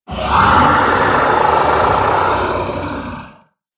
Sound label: Dragon Gurgle, monster; Monster Sound Effects; horror sound effects